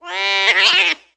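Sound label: Cat Domestic Meow Angry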